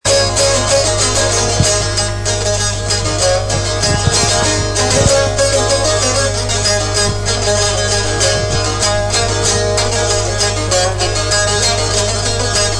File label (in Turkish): tek saz